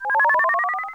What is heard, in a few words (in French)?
Soin